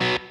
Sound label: Staccato B